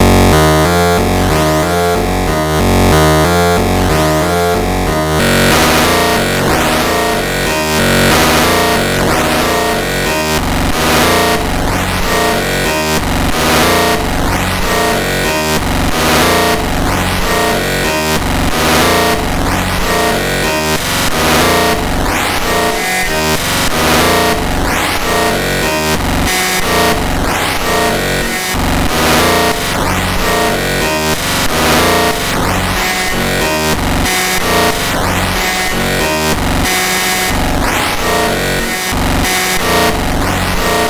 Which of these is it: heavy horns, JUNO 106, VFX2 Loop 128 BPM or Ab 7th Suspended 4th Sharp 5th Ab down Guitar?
heavy horns